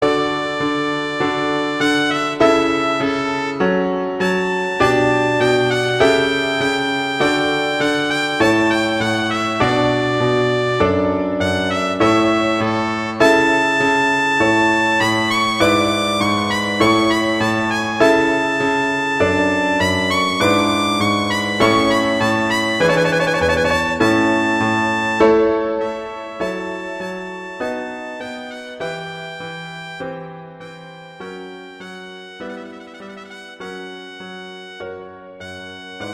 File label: Adagio; Telemann